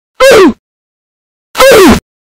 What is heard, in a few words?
OOf